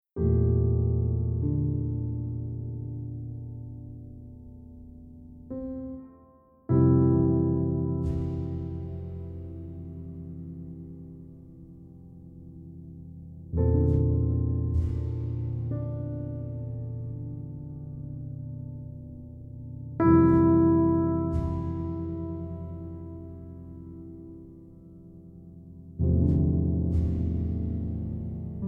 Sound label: piano quiet